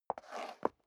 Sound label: step leather shoes concrete scuff